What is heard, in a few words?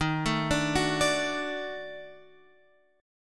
D sharp Minor Major 7th Flat 5th, Guitar Open F; arpeggiated